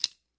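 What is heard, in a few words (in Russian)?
щелчок открывающейся крышки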